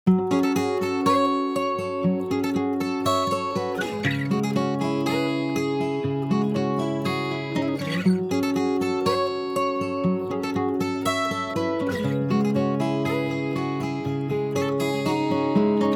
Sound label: Guitar